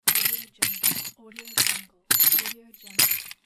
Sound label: keys fall on a chair